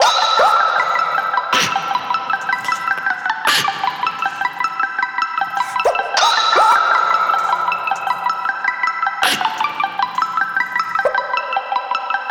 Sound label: 156 melodic stack Emin